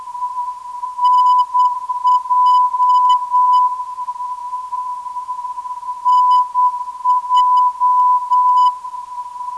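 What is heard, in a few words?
384.6kHz, non-directional beacon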